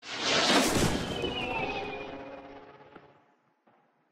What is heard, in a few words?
tank ricochet